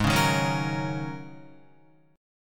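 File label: A flat 9th Suspended 4th A flat Guitar, chord; Ab9sus4; strummed; 4F,4A,5C,5G,5C,6E; chordshape; 3,4,3,6,6,6; JGuitar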